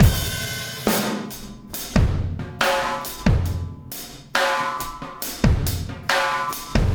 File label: Impact Crater Beat, Processed Drumkits